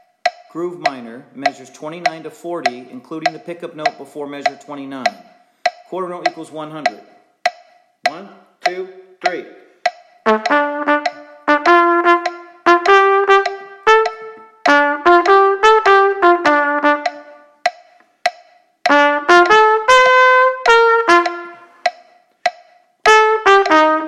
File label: Play-along, Groove Minor, qn=100, trumpet